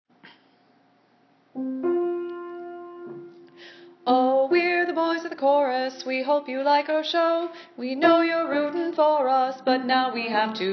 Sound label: Boys of Chorus